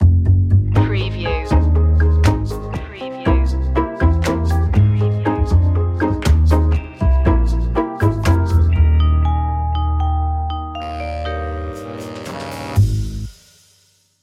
funny mystery

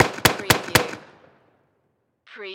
Pistol 45 Magnum

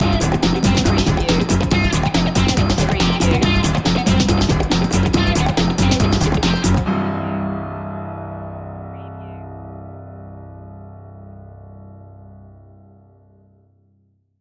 loop ending

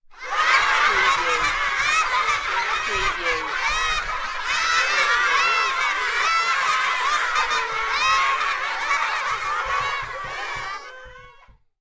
Children Laughing

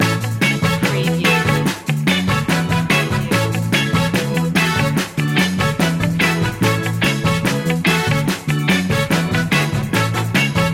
give me one time loop

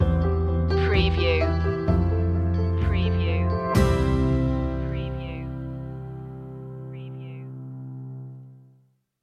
summer is coming stinger

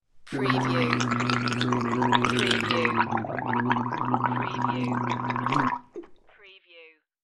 Gargling

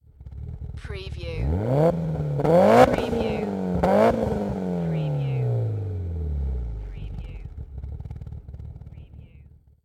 MITSUBISHI EVOLOUTION EXHAUST TRIPLE REV

